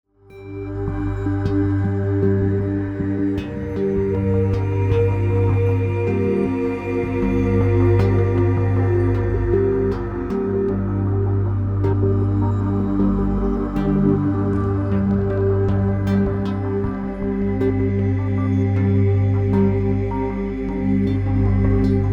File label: Ambient mysterious brooding, Video Music